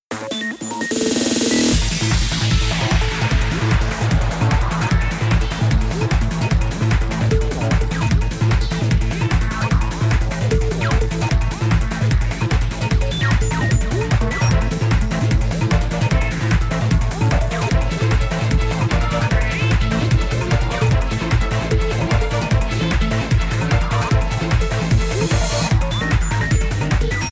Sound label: typing, music bossgame; tf2ware ultimate